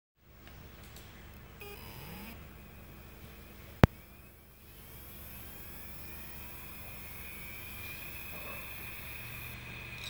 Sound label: Seagate, damaged hard drive sounds; grenada με ring